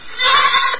korotkoe bleyanie molodoj kozi

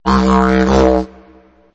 didzherido odinochnij v prostranstve

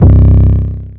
808 2 c 1 sek naushniki

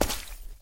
blizkij myagkij korotkij bulk vodi

